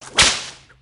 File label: whip; soundboard